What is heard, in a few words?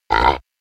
Money